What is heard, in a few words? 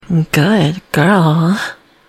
music stinger; killstreak music